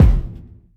short reverb bass drum one shot e key, Royality free kick single hit tuned to the E